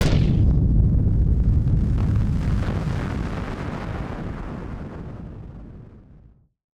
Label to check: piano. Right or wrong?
Wrong